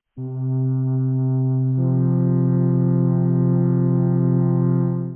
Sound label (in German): flöte c1 f1; Intervalle, die man durch Potenzen von 2 und 3 darstellen kann